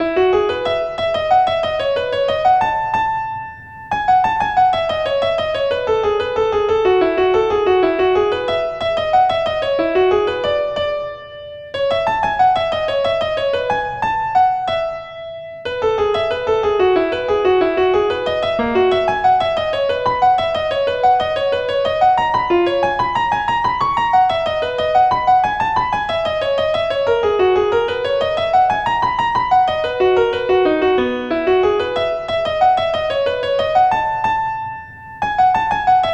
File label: Violin Concerto; JSBach; BWV1042